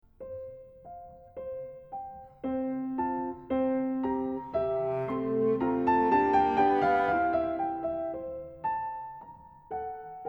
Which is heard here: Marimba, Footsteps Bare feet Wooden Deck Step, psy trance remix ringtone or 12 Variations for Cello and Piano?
12 Variations for Cello and Piano